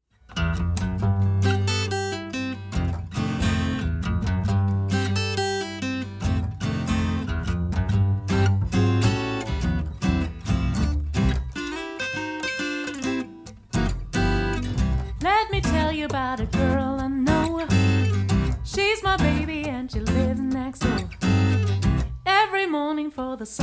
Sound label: acoustic trio